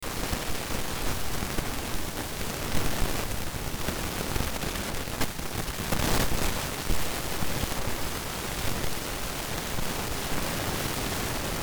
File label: Noise